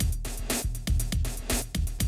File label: 120, Classic Drum Loops